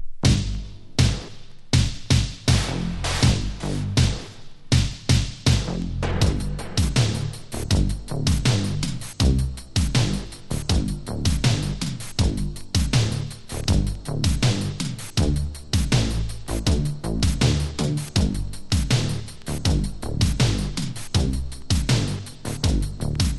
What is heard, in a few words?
Electro Reggae